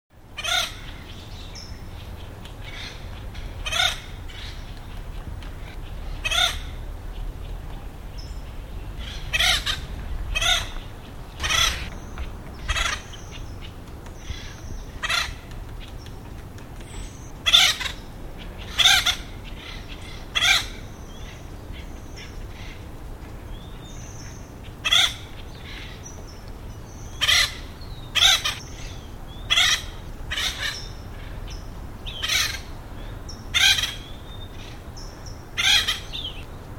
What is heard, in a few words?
Te Deum; deanza project